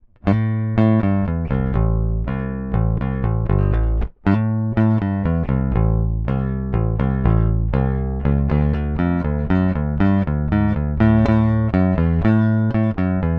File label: Rock Pic, JBass Linear